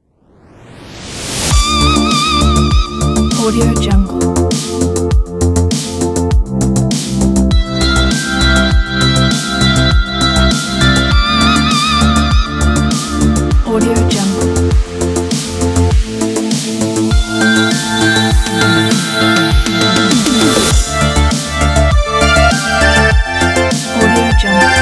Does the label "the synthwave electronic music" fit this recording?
Yes